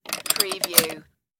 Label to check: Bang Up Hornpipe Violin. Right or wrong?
Wrong